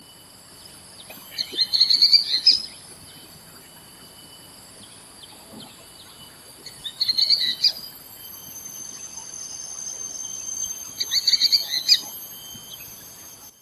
Whiskered Treeswift courtship calls, Kledang Sayong Forest Reserve Ipoh Perak Malaysia